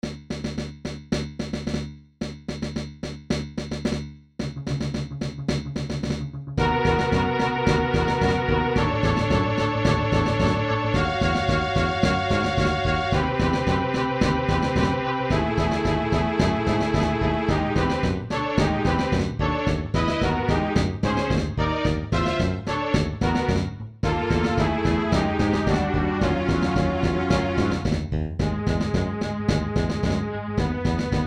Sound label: dissonant march